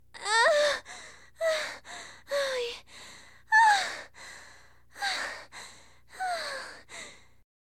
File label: Sex Lines Orgasm; Hilzartov; Fairy Size Doesn't Matter